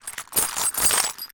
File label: Foley Metal Clamps Plastic Container Holes Rattles